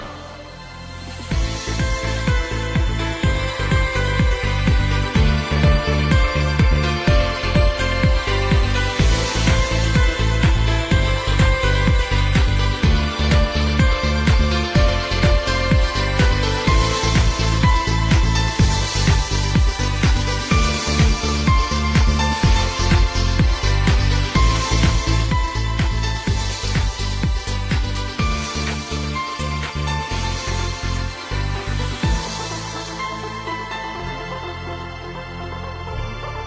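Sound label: Instrumental Music, Football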